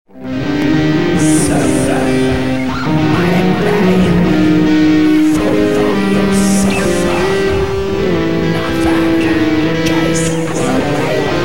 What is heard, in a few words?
guitar intro